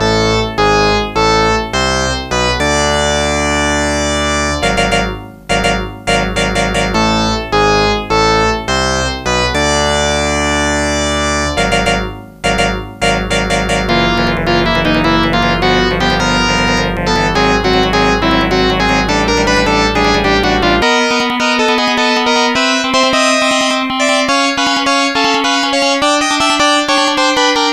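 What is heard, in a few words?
background music; Legendary Wings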